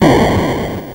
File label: Atari Noise Long, Atari 2600; 8-Bit; Chiptune; FX